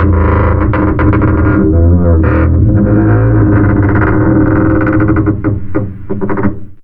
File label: creaking; pyntnclick